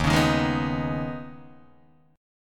D sharp 7th Suspended 4th Sharp 5th D sharp, Guitar, Open D